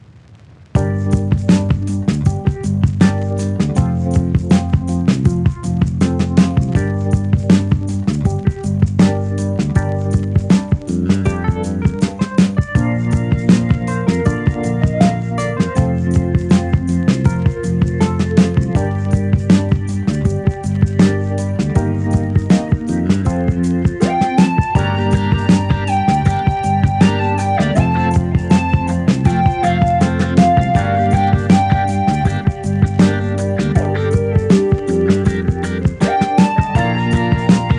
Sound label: barka funk